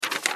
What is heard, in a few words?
notification; Tecno; M9